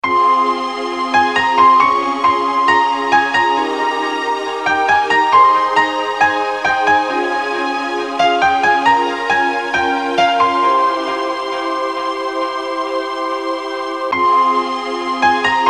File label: Piano relax; 90s